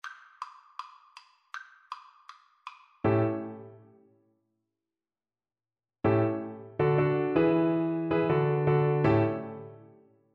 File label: happiness is the truth Double Bass